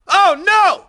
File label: hit bad, grunt; nimble grunt